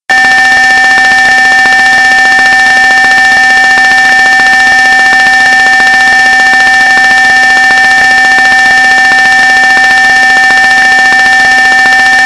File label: fire bell modern 757 767